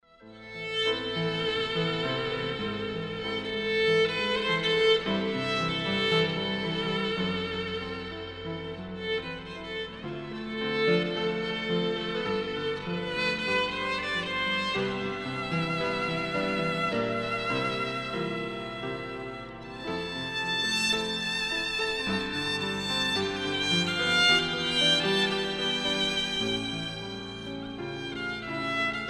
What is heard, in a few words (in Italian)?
VIOLINO